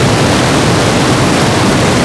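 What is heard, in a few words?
waterfall; WhiteOut; XBOX; jackson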